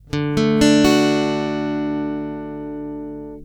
accord re majeur lent, l’accord de Ré Majeur en vitesse ralentie; guitare

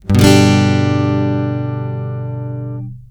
accord sol majeur, l’accord de Sol Majeur en vitesse normale; guitare

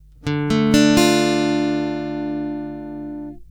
accord re mineur lent, guitare

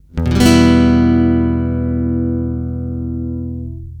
accord mi mineur, l’accord de Mi Mineur en vitesse normale; guitare

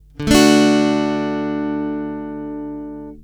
accord re majeur, l’accord de Ré Majeur en vitesse normale; guitare

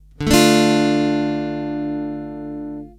accord re mineur, l’accord de Ré Mineur en vitesse normale; guitare

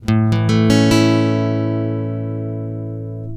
accord la majeur lent, l’accord de La Majeur en vitesse ralentie; guitare

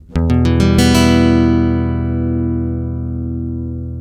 accord mi mineur lent, l’accord de Mi Mineur en vitesse ralentie; guitare

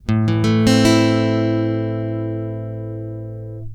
accord la mineur lent, l’accord de La Mineur en vitesse ralentie; guitare

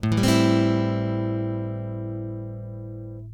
accord la mineur 7, l’accord de La Mineur 7 en vitesse normale; guitare

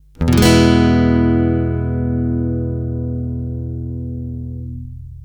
accord mi majeur, l’accord de Mi Majeur en vitesse normale; guitare

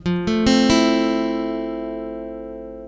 accord fa majeur simplifie lent, l’accord de Fa Majeur 7 en vitesse ralentie; guitare

